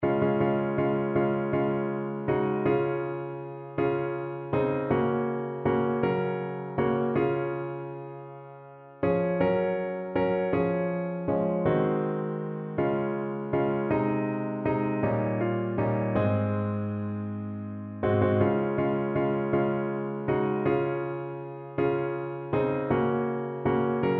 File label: piano